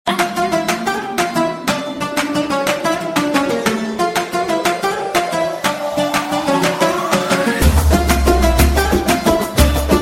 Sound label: music, ringtone